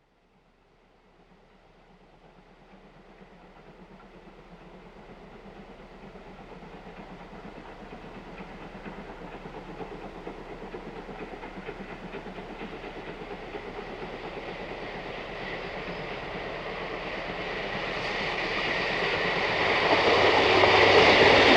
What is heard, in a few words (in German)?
Dampf Begegnung